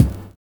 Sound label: LOW TOM; Univers Sons - Kitch 70s